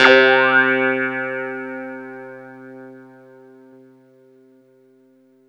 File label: CLAVINET; Ragga & Dub Reggae